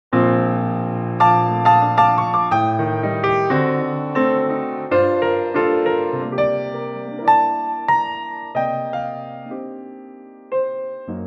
Bb piano; key - Bb; Pianotrak; Lovely and laidback piano only arrangement of the classic standard, easy to follow but no real tempo

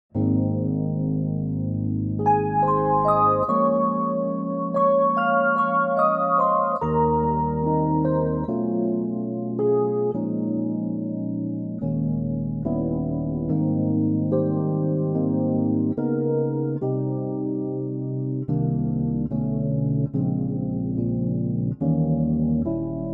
Arturia

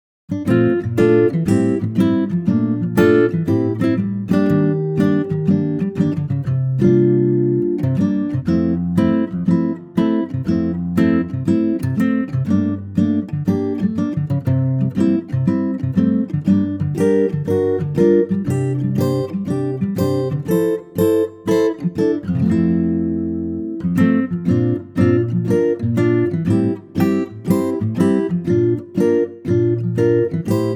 F to Gb acoustic guitar

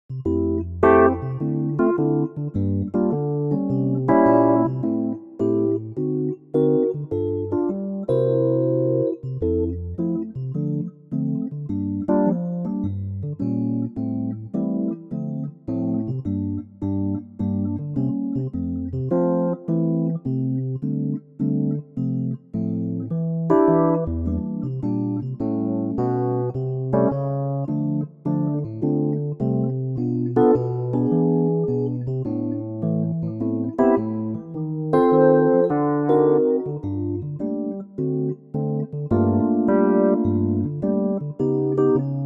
E piano F

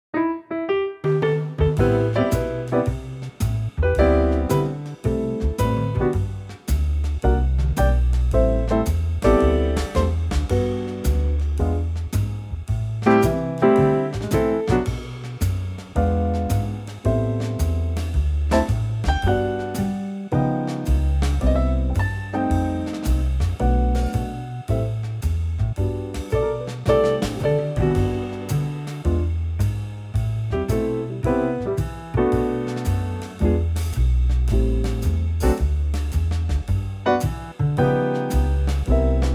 G trio

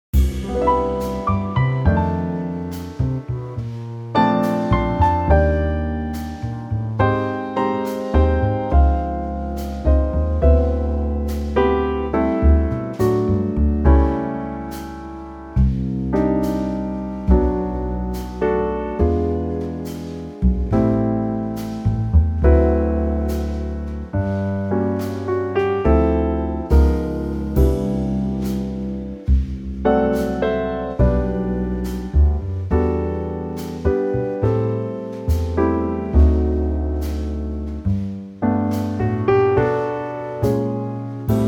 G trio

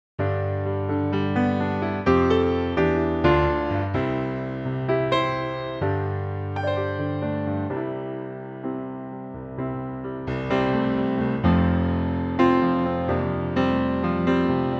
piano C; Pianotrak; key - C - vocal range - G to C; in a lower key!

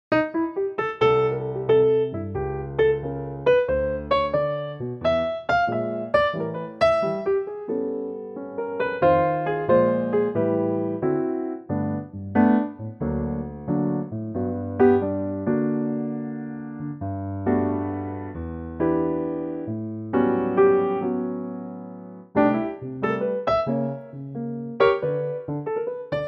G piano

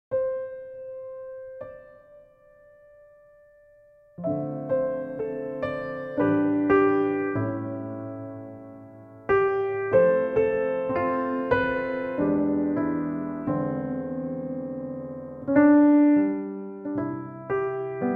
piano; Pianotrak; key - C - vocal range - C to E; beautiful piano only arrangement

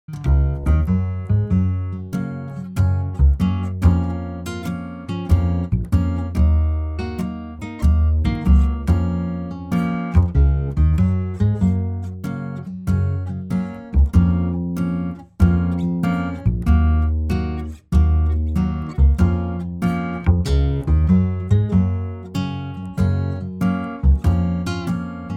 swing Gb

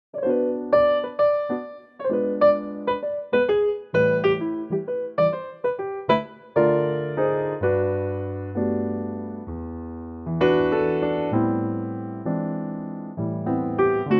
G piano; key - G - vocal range - G to G

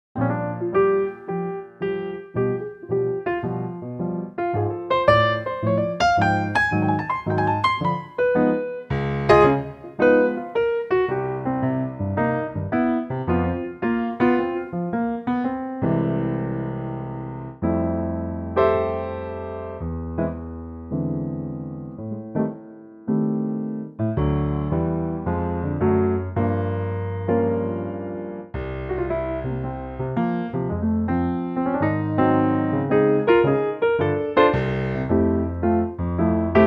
piano F

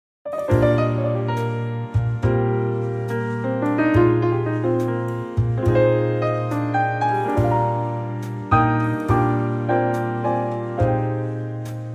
AMANDA trio

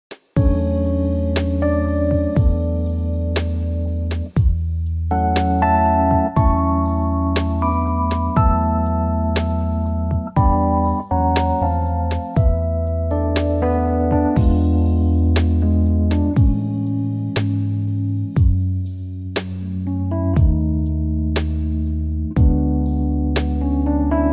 A smooth; key - A - vocal range - E to B

